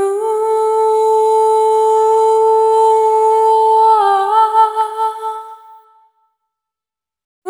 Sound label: Single Voice Melodic Loops, Vocal Samples